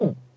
Player Damage

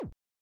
Tower Damage; 3DTD

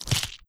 slice enemy

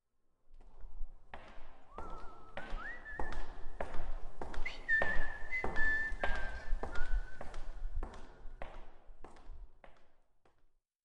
Menu Whistle Intro

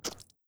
enemy killed by projectile

